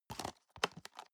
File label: plastic box food small open medium